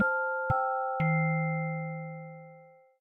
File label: Bells, notifications; Samsung Galaxy-S25-Ultra; Calm